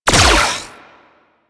Beam Shot Fire; Glaxion Beam Moa

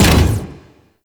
Moa Leap Land Sweetener; Warframe; Venus; Venus Terra Moa; Abilities

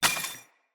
Casting Device Drop, Weapons Tech; Enemy

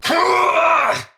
Attack Effort, Battle; en; Tau; Tau Dax Knight

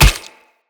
Hammer Impact Flesh; Weapon Impacts; Player; Combat

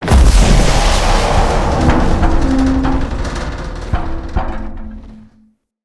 Pull Beam Start; Encounters; Collector

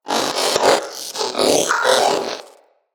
Companion Vocal Breath Run, Pets; Zanuka

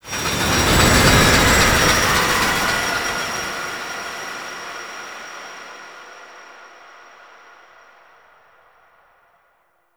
Train Passby; Warframe; Entrati

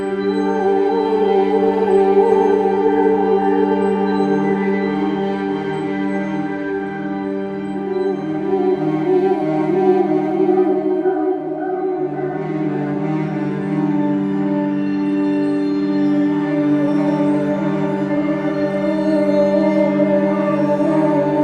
Waiting Room Cue; Duviri; Warframe; Stingers

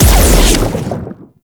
Overhead Smash Attack Impact; Enemies; Warframe; Grineer Nightwatch

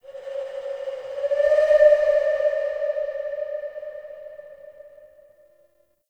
Cave Mysterious Distant; Ambience; Midrath Island; Shrine Cave

